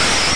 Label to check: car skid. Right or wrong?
Right